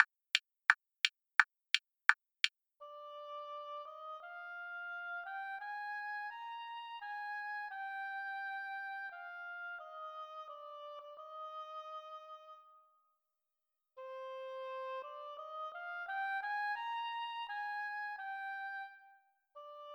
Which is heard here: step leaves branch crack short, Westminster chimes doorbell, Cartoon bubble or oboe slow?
oboe slow